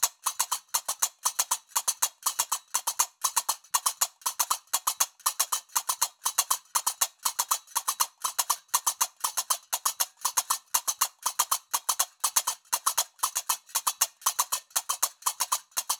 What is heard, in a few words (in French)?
loop binaire tempo 120; Qaraqab; qraqeb; Maroc